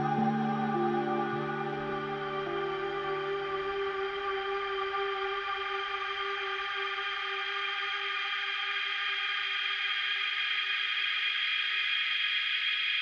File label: Infinity Loop, Pads; BB 2HTC Samples Vol 4